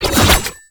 Bayonet Melee Combo

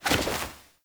Generic Air Roll Whoosh; Warframe; Characters

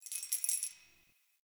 Keys Jingle

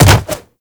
Jump Attack Default; Wall Run; Footsteps

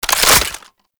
Reload, Weapons; Warframe; Braton Remaster

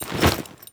Generic Foley; Warframe; Temple

